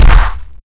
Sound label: upper cut